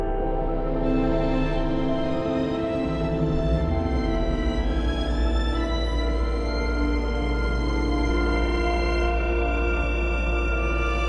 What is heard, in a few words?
Steelworks Slow; rive wreck hack die retry; ps4